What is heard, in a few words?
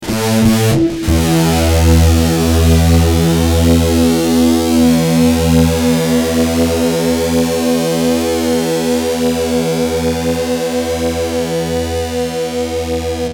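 fuzz guitar, MXR flanger doubler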